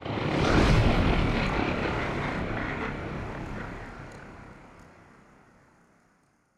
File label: ship corvette move; Stellaris; machineage; ships